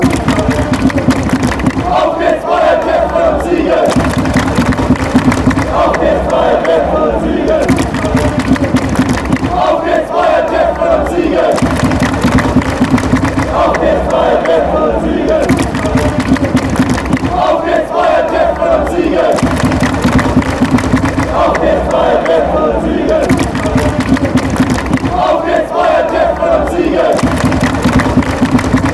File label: come on fight and win, fanchants; bayer